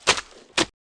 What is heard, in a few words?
MUD